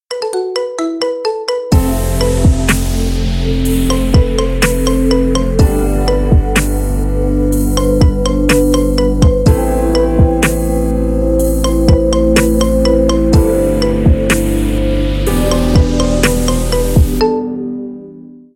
marimba remix rington; russian

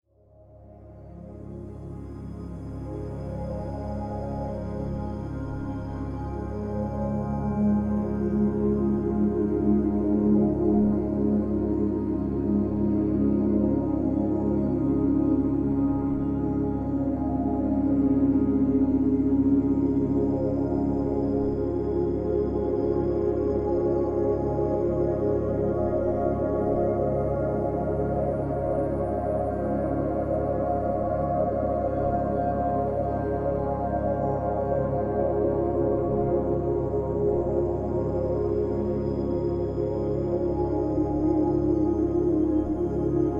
deep sleep music ambient sleep music

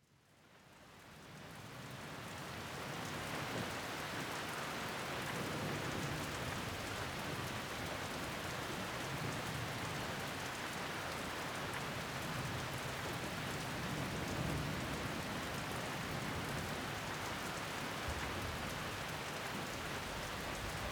ephemeral thunderstorm

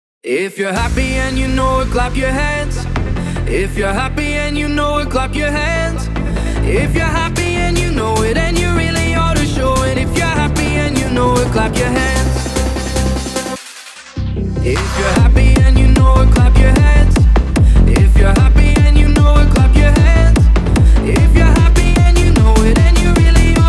If You're Happy & You Know It toddler techno

